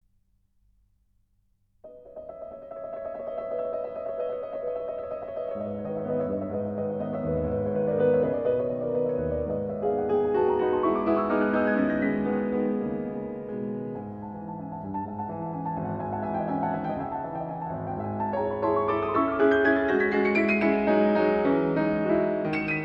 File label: Etudes in G sharp minor